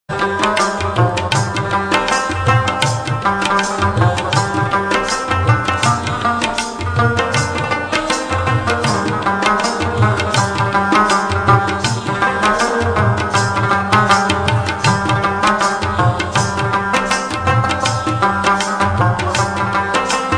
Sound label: Pashto